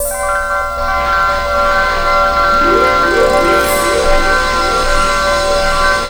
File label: Harp; Blu Mar Ten; Jungle Jungle - 1989 to 1999 Samplepack